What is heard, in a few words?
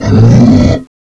ourano big flinch